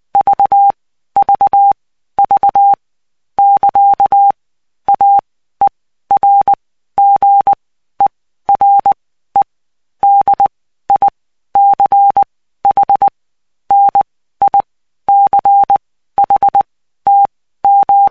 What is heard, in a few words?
cw 75BPM